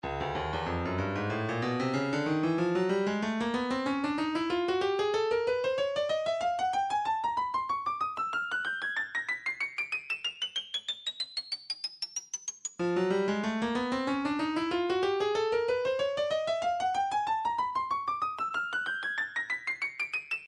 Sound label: breakbeat 094 bpm; bang up b; Stylus Breakbeat Midi Files